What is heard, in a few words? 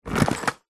household vacuum cleaner pick up from tiled floor while off